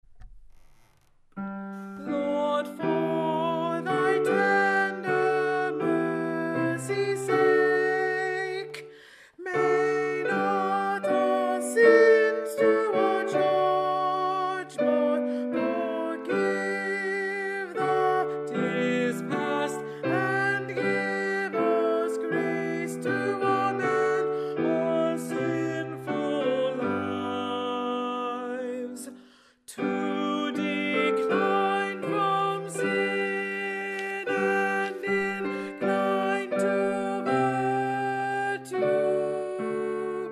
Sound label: SLOW Alto; Lord, For Thy Tender Mercy’s Sake-Slow, D. Farrant; Lent